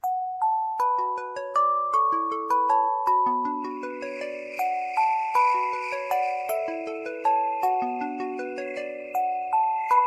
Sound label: lg ringtone